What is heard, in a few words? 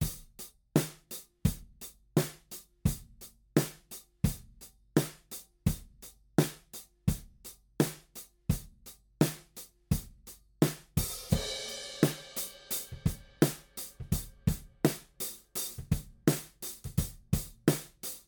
drum overhead mics spaced pair wide